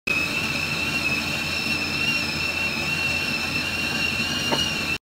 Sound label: Water kettle whistle; سوت کتری آب